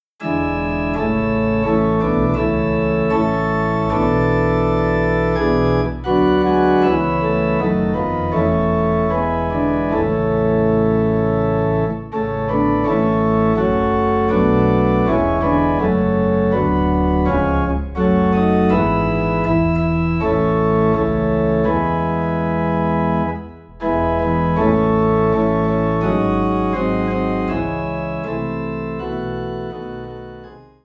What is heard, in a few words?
Thou Didst Leave Thy Throne - Organ Low in A; christmas